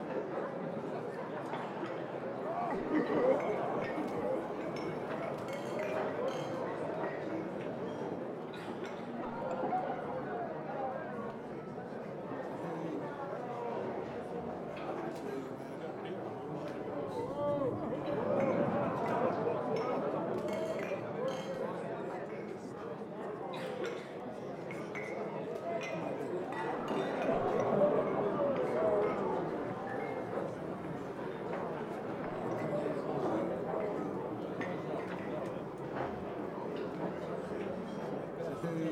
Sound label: ambient tavern